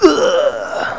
PUKE

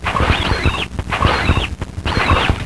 BIRD BATH

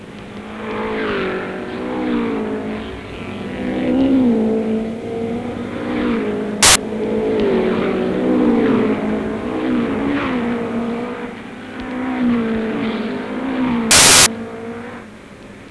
race cars

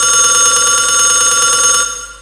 ALARM

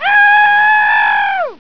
yell

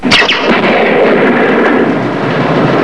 SFX THUND; spook

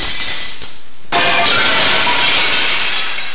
glass brk

